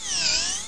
zoom

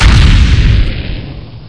die; axisdemo

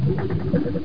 bubble; dosgames; roc

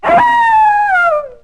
coyote; animal; animal fun

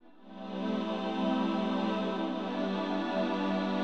SYNTHPAD, HOUSE, 125, A; eJay; Sphere

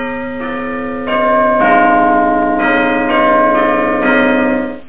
BELLS, ALARMS

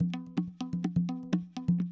PERCLOOP ETHNO 125; eJay

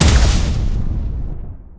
plasma; StarSiege

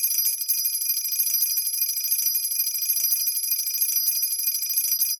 City Shake; Pirates Of Treasure Island

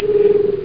Purr; Star Trek; Guided Tour

